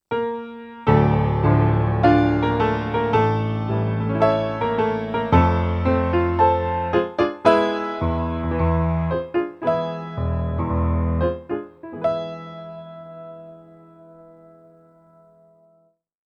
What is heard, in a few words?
Vintage Grand Etude